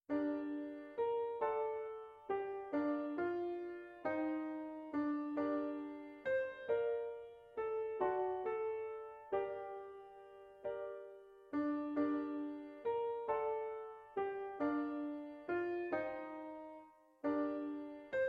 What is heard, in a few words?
Appin Tragedy Gm alto sung